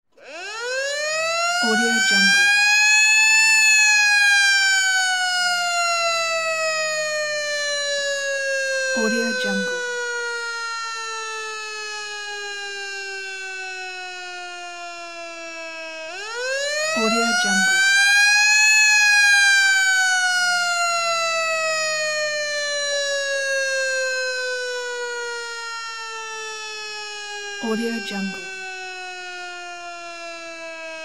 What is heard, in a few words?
siren